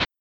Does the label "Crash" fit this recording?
No